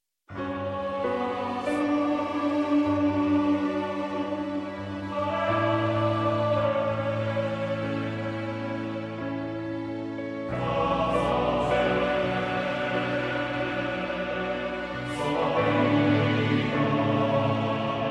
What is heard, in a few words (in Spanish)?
emocionante musica